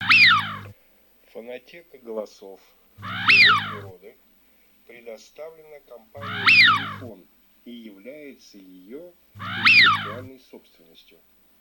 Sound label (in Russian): Свиязь Fischione; chip mini 234 plurifon